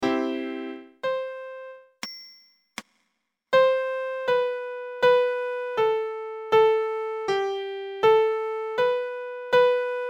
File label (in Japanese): ピアノ音声